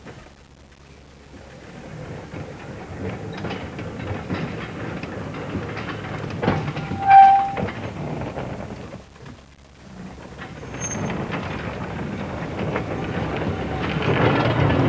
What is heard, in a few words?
brake, busses; WAV Sound Effects; transpo